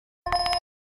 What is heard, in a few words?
camera focus